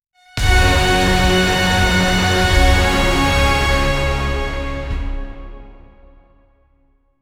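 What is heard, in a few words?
hybrid orchestral cinematic, trailer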